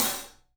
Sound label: SABIAN HAT; 3D Sound; 3D Drums